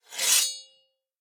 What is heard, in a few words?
minion battlefoley swordscrape; smite; npc; roman archer